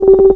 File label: Map Zoom